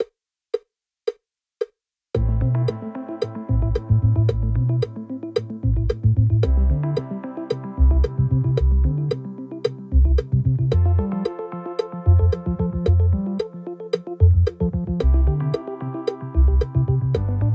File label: Backing Track with click 112bpm, percussion